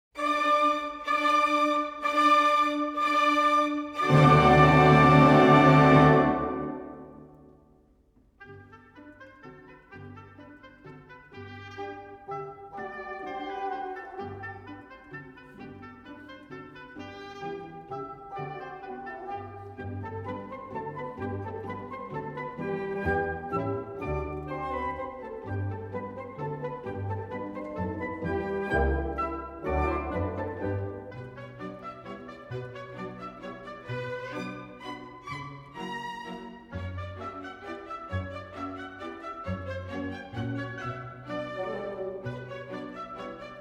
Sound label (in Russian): Фантастический балет Allegretto delicato; Оффенбах; 'Бабочка'